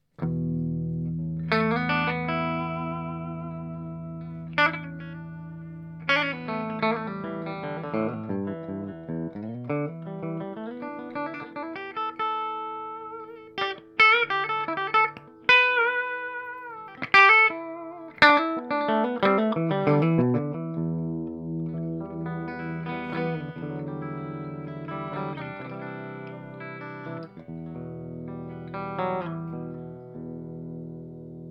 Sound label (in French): trubucker clair chevalet